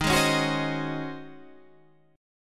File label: Db Major 7th Suspended 2nd Suspended 4th Eb